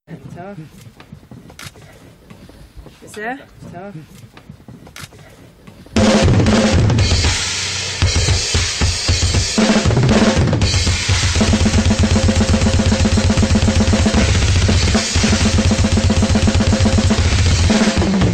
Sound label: NORWEGIAN BLAST BEATS; chemtrailArtTrash